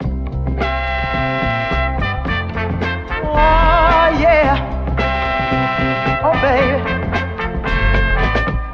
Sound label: horns wah yeah oh babe; Loops; Phonky